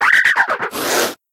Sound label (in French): Cri Manglouton